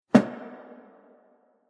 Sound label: Single Dramatic Hit, impact